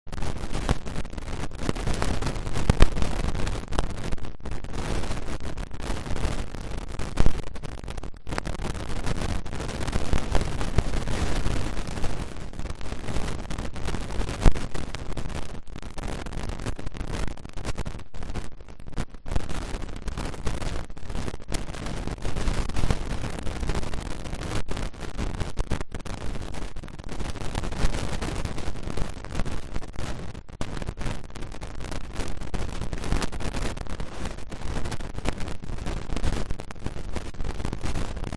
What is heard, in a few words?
riverside; Kyoto U; Ashiu